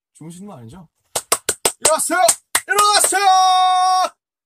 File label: Wake Up